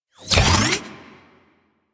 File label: Plasmor Equip, Shotgun; Warframe; Weapons; Corpus; Arca Plasmor